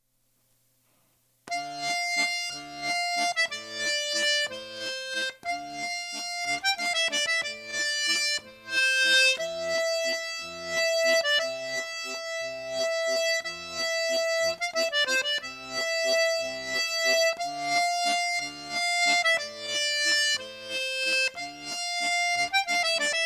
voix

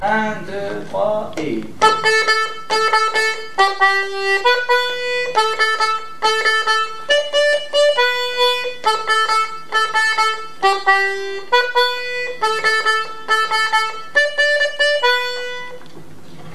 violons; Chavalen Romalen

